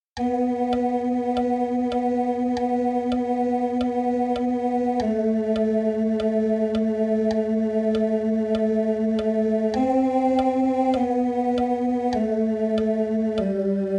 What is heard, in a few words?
Salve Regina, TEN